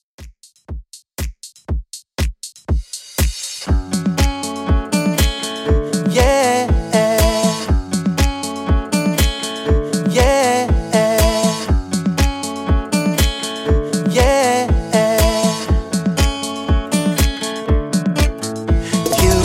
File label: 120 Bpm; Redrum